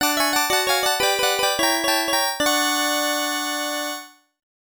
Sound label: Game Over Music; Toy Pop; Sharp X1 Turbo